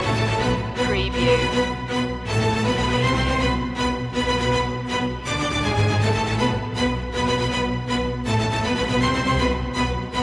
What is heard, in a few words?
strings only